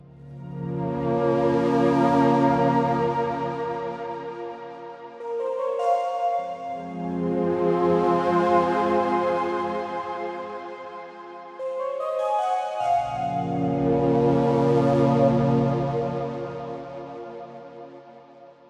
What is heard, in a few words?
Loop 150bpm; jenny leclue detectiv